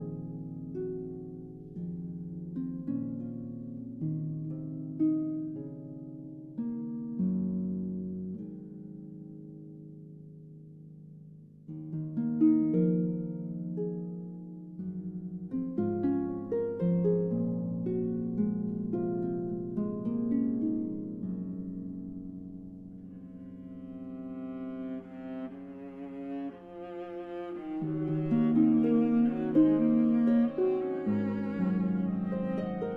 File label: Harp Concerto Lullaby